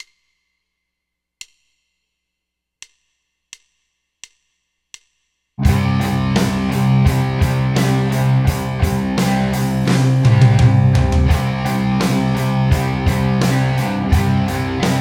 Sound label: Jingle Bells